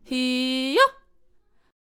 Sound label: Celestial Aegis Attack Vocals